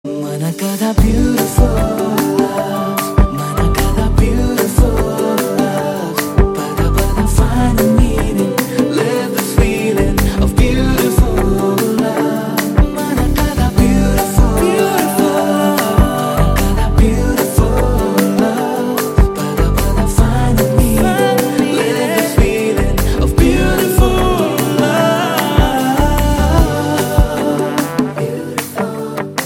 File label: Ringtone